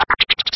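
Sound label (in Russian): атака инопланетного существа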